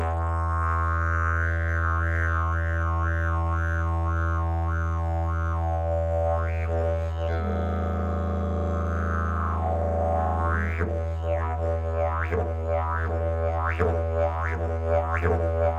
jilm stromovy, didgeridoo

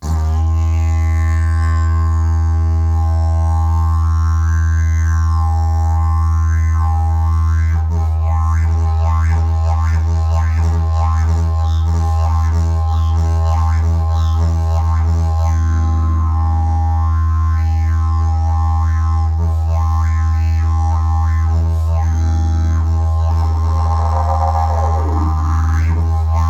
jasan oko, didgeridoo

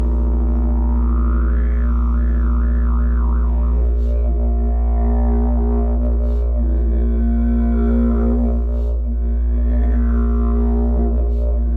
jilm ultrabass, didgeridoo

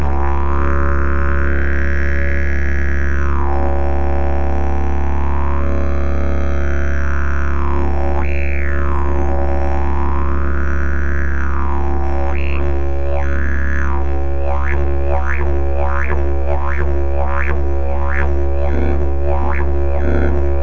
jilm, didgeridoo

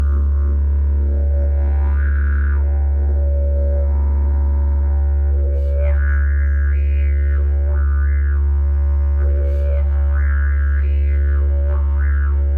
jilm, didgeridoo

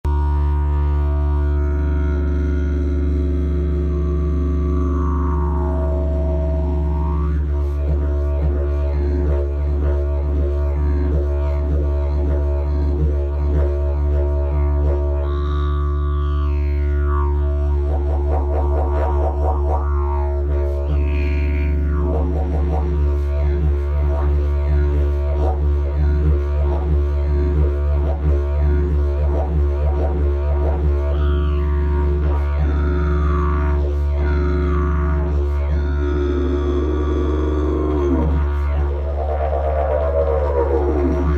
jilm koncertni, didgeridoo

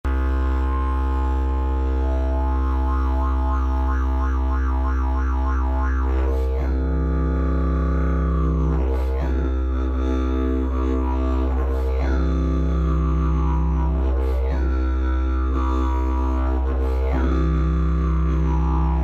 javor, didgeridoo

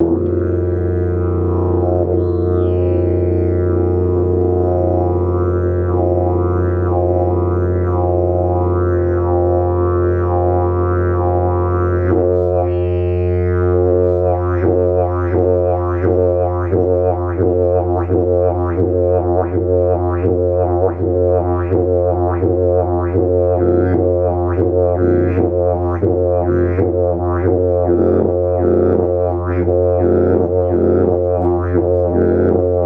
jilm obr, didgeridoo

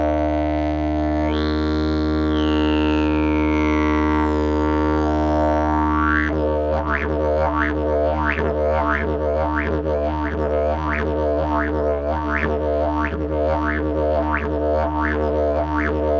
javor, didgeridoo

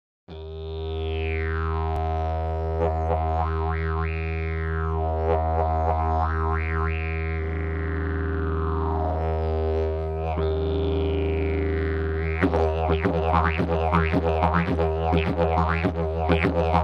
javor spiraly, didgeridoo

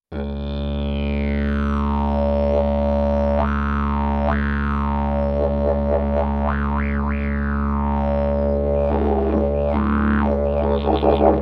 jilm stromovy, didgeridoo

